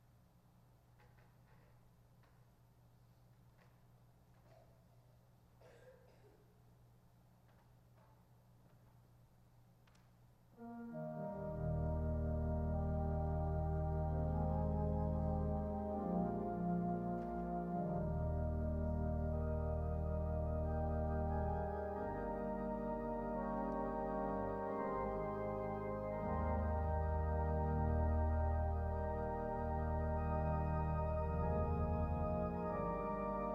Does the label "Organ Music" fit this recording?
Yes